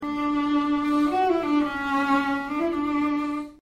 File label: viola